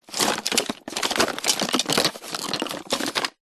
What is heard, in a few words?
household tub small containing odds and ends rummage; zapsplat